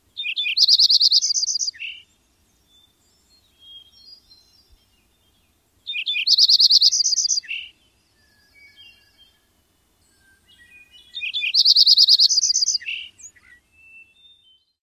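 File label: song, Lincoln's sparrow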